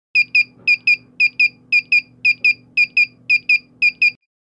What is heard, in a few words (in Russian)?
Запись времени открывания сдвоенные сигналы